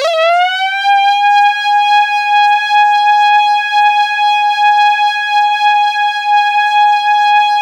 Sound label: C5, Synth Line; 90 s Sample CDs; NiceBeats; SynthLine VOL-24